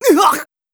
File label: voice damage